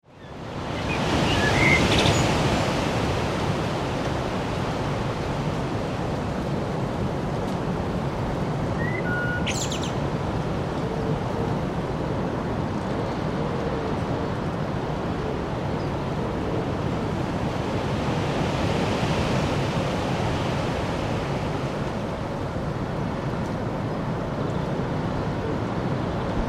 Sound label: Wind in Trees Heavy with Birdsong; Wind (Sound Effects:Weather)